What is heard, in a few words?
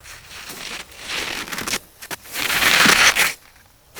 crunchy snow being packed together